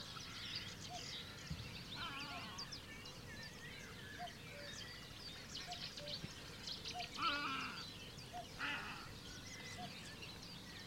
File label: Little egret